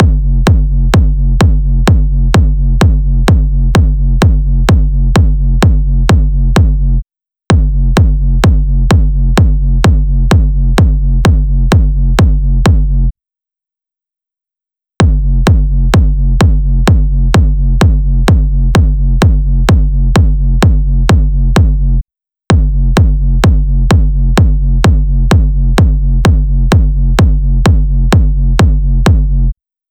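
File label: Drum Loop 128BPM bass drum G; VEC5; Drumloops; Multi Loops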